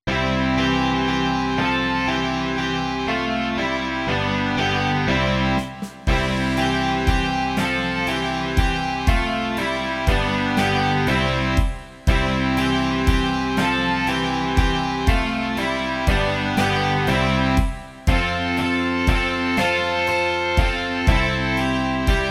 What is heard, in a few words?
rock